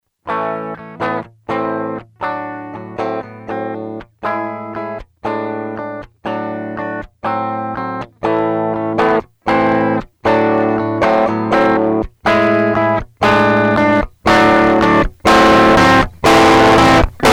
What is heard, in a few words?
reamp plus swell